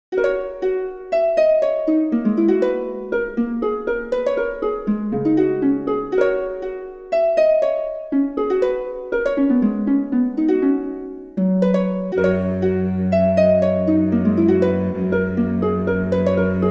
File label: Harp Test